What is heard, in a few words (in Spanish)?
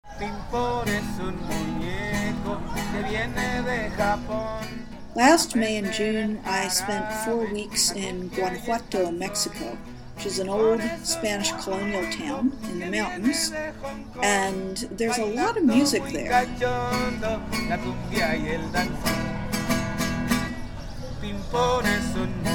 guitarrista